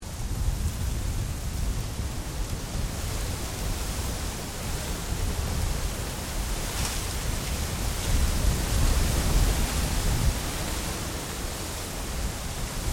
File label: Wind Extreme Through Palm Trees Loop; Weather